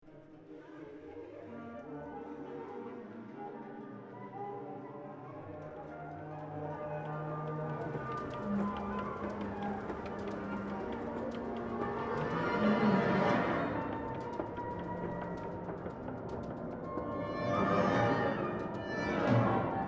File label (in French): Orchestre